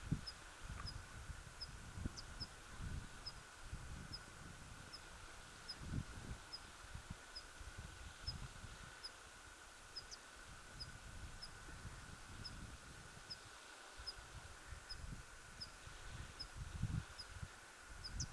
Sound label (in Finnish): kuvien emometsakirvislintu